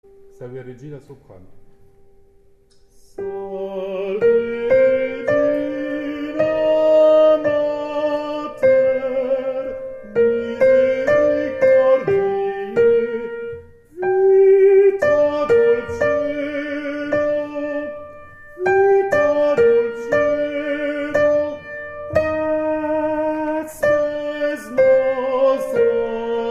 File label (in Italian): Soprani; Salve Regina